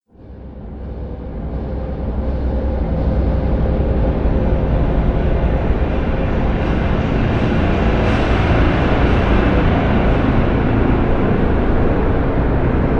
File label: Scary Horror Drone; Sound Design; Drone Sound Effects